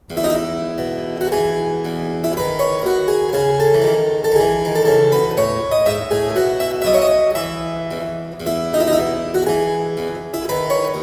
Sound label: Suite in F - Scotch Tune, Keyboard Music, spoken; William Croft